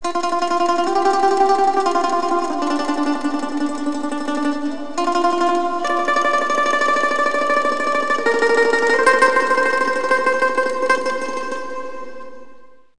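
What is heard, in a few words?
balalaika; New Century Encyclopedia and Reference Collection II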